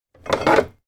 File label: household wine bottle remove from fridge door